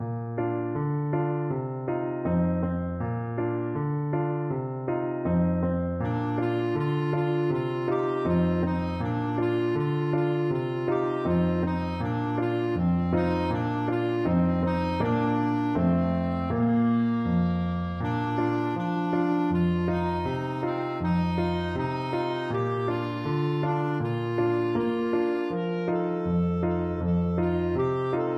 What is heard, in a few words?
voice clarinet; dayenu